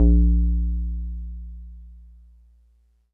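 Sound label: Wood Piano DX100 A#1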